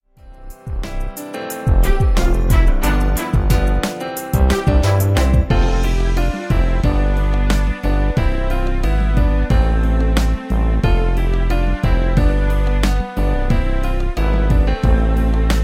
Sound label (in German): Hirten-Rap Playback